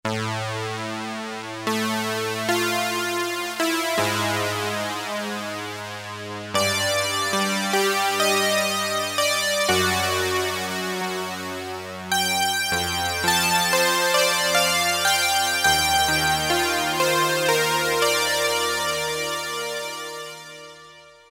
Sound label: POLYSYN; Akai SG01v; JMJ POLYSYN